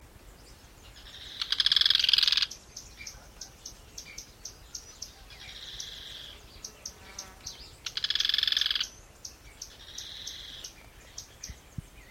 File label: certhiaxis cinnamomea